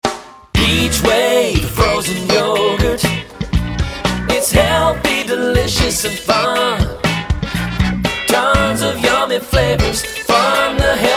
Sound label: Full Sing; Peachwave